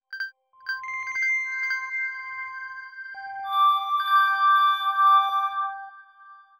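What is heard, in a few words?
granular bell accent C major; bespoke - Floral v.2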